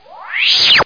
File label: Squeezers; Bedlam 2 1.1.1